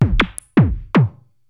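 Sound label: percussion, ambient, 160; eJay; Drum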